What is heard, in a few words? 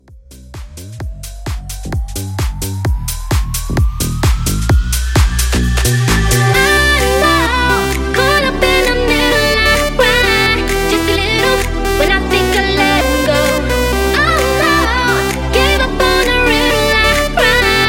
Hybrid Mashup 130